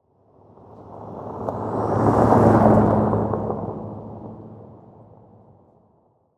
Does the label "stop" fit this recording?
No